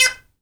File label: toy down, toys perc; AKAI S-Series CD-ROM Sound Library VOL-5